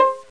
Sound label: Violin "pizz" C4; Indiana Jones; iMuse